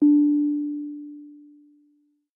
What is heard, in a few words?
homepod setup